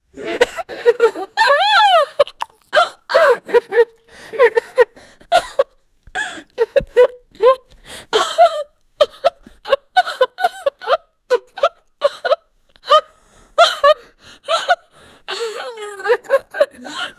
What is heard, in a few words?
young woman chugging beer